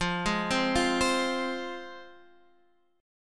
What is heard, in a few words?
F Minor F down; arpeggiated